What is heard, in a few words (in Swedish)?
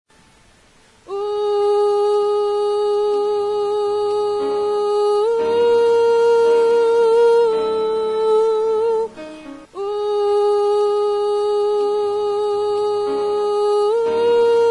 bas, stammor